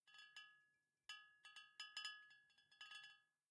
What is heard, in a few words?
Bottle Shake; The Town